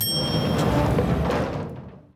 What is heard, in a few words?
elevator door